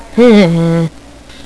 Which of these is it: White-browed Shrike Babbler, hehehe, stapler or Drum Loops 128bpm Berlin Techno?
hehehe